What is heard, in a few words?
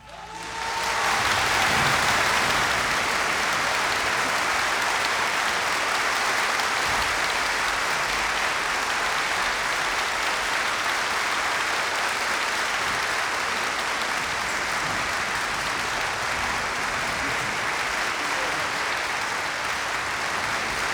Applause